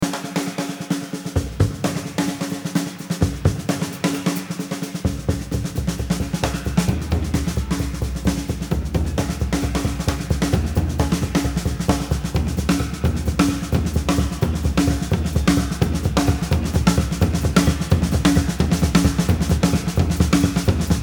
Drum Solo

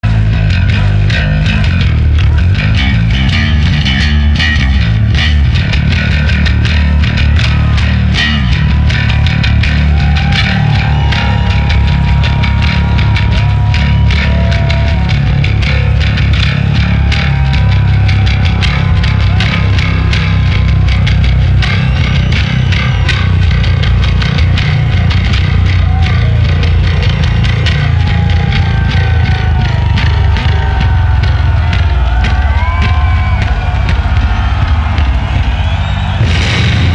Bass Solo

